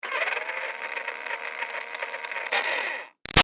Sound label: Toy Bounce Back Racer Med Far Run Short, toy car; Toy Cars; Toys